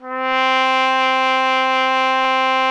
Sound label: BRS TRUMPETS, Roland LA; Roland - LA Composer vol. 1